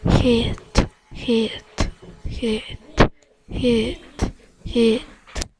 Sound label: hit